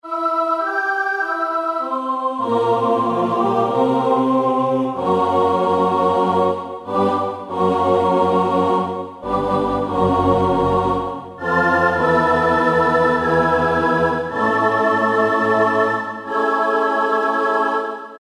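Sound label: Octave Choir, Roland U-110; Factory Patch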